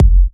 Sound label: Trance Kicks